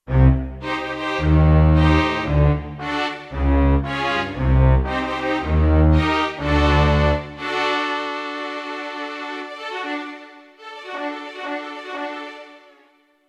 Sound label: Dynamic Orchestra, Kurzweil K2500X; Factory Programs